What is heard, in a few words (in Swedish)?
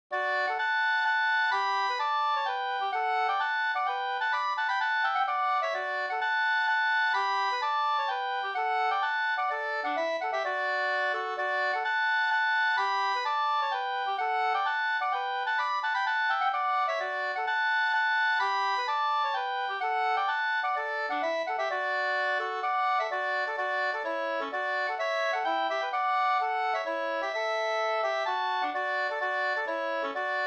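polska, tvåstämmig; Snasa no; Oskar Andersson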